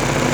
cable reel in loop

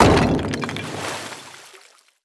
objects, World of Tanks

